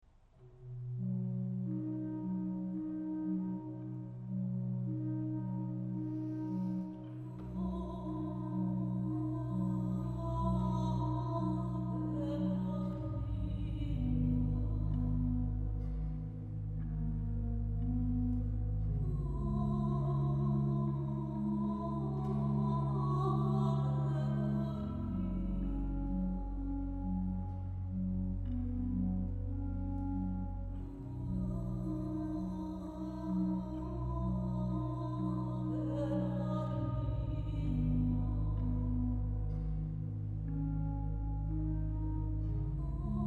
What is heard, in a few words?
Ave Maria, ORGUE